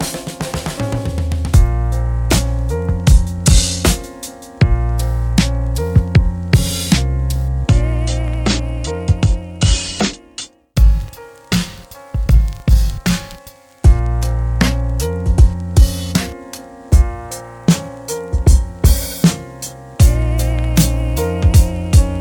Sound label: dirty drums; boom bap labs; nightwalker; dirty weapons